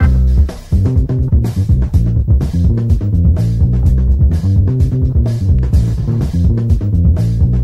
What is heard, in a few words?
full mainly the bass, Loops Phonky